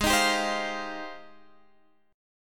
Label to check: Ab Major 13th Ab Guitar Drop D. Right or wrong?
Right